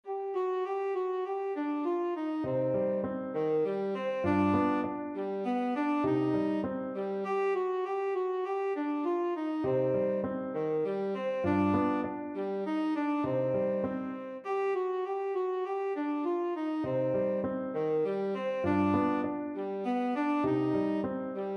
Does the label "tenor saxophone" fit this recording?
Yes